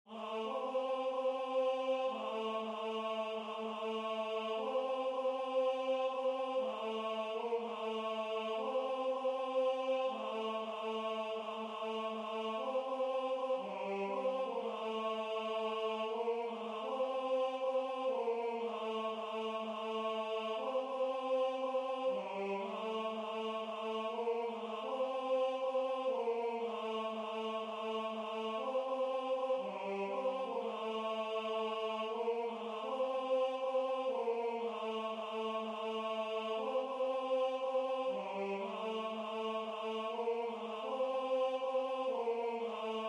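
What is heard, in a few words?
Tenor, Religious Folk Songs Of the Negro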